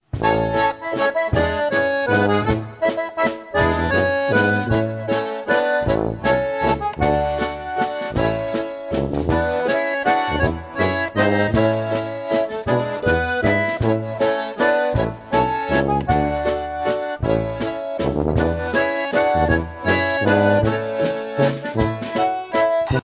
WALTZ

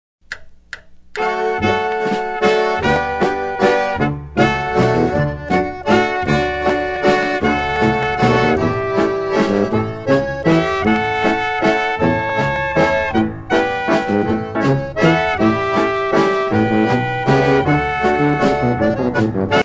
waltz

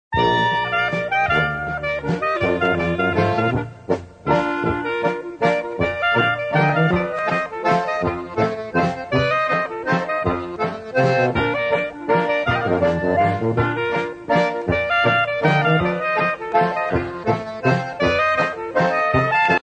CLARINET LAENDLER

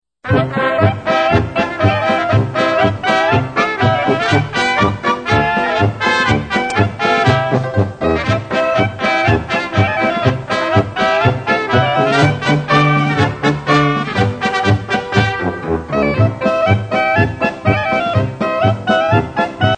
POLKA

